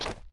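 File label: shuffle, footsteps hard surface jump up; grunt footsteps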